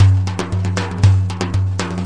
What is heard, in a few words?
117 bpm mideast truckin along, drum loops and breaks